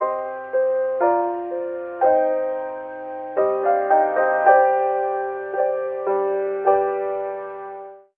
piano, Loops, Sad

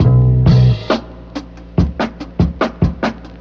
bassed out, Drum loops, Funky

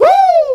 "woo", male; ooh ahh; ho hoo woo

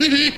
hee hee cattoon, laugh; ooh ahh

